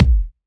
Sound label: Kick, Drum Hits